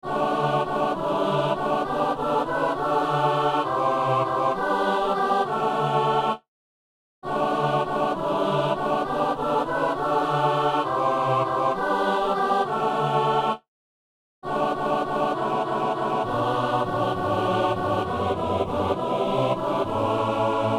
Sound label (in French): messe sanctus; messe de saint boniface